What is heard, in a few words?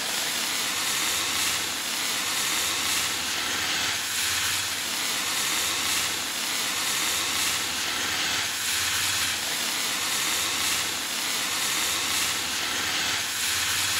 Flare Loop